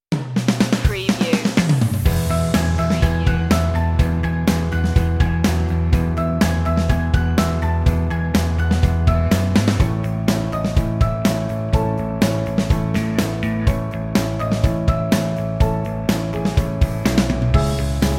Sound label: no vocal